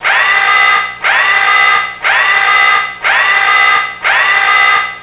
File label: WARNING